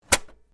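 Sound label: sounds of CD player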